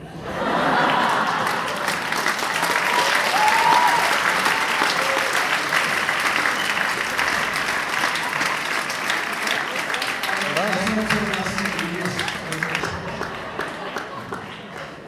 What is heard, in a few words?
Laugh And Applause